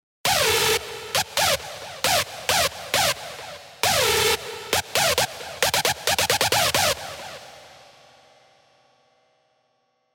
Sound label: Screech; Phonophobia; revealed serum 2 rave asylum